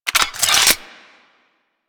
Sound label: Sword And Whip Unequip; Warframe